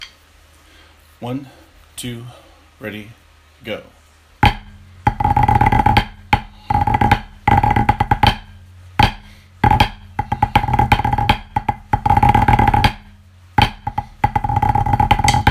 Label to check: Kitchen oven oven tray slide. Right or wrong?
Wrong